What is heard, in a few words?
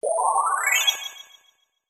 magic status cure